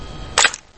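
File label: photographing in a noisy place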